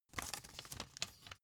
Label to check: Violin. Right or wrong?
Wrong